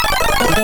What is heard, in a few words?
jingles; superscramble